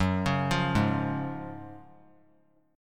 F sharp Major 7th Suspended 2nd, F sharp, down; arpeggiated; JGuitar

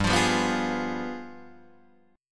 G 7th Flat 9th, Guitar, Open D

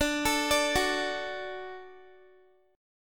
D Major D down Guitar; arpeggiated; tuning 4E,4A,5D,5G,5C,6F

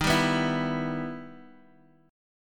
E Minor Major 7th Eb

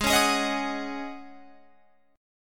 Ab 7th Suspended 4th Ab down Guitar Open F, Chord; Ab7sus4 strummed

